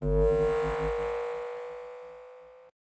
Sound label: power On; Electricity; Barotrauma; Subsurface